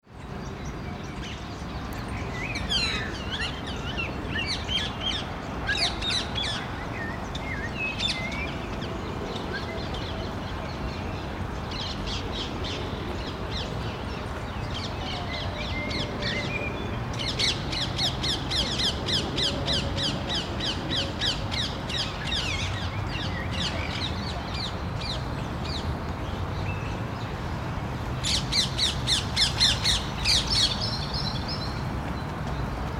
Park Parakeets, Wild parakeets in Hyde Park, London.; Birds; Animals